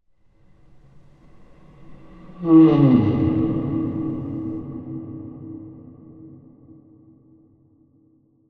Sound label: horror groan wooden haunting; zapsplat